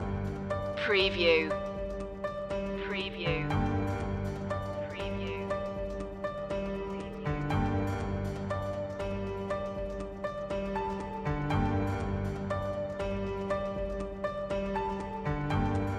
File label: descant sky loop